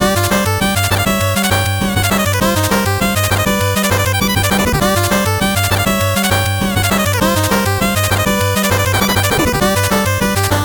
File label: 8bit bgm fast